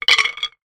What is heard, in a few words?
sport cricket stumps drop down on hard ground; zapsplat